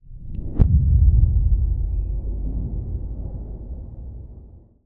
cinematic hit; geophonic design vol 2; sonniss gdc

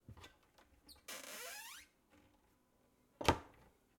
doors misc cupboard open and close creak; sonniss gdc

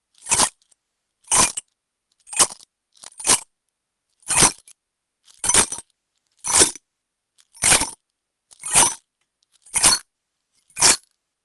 coins throwing from hand to hand mono; sonniss gdc

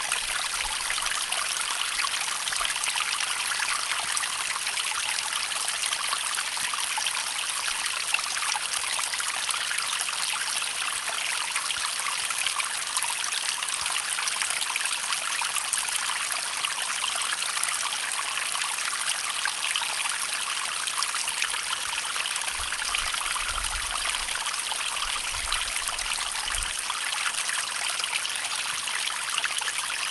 mountain stream runoff loop; sonniss gdc

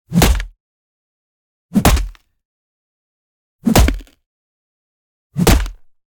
melee designed headbutt crack; sonniss gdc

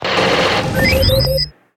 robot electronic

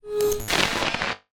ui glitch whoosh glitch grains; roguewaves; Sonniss GDC

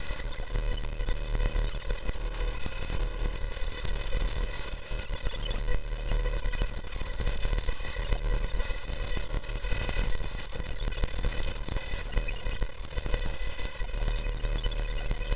process malfunction computer glitch; sonniss gdc

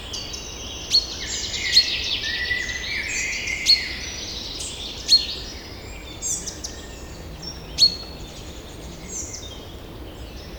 forest day, Nature; Loops

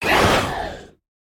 creature monster attack; sonniss gdc

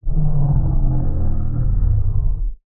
mechanimal; fvtt sonniss gdc audio

